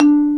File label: Bass Marimba